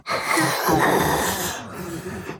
inspect; devour 2021 gamerip pc macos

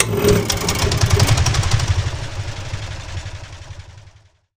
SFX Guild Shop Button; android; Portal Quest

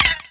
down test

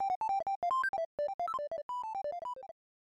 Failure; super bomberman 5

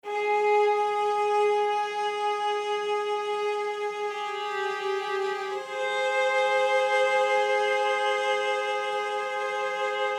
Emotional (Loop)

